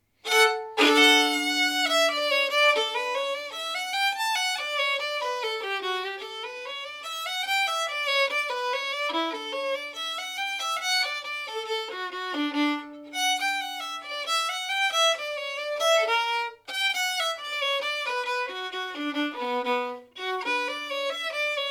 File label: fiddle; Bach